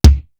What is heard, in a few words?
round kick; East West; Global Sounds